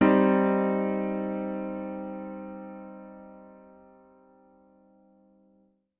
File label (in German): moll akk, gehoerbildung